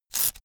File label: velcro large open quick